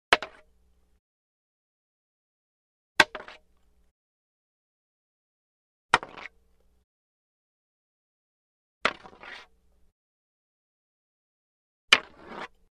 Staff Hits With Light Scrape Light Wood Staff Impact; Hollywood Edge Foley Sound Library